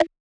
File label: perc drive; Hip-Hop; Drum One Shots; SD CAICOS Hip-Hop Sample Pack; Slate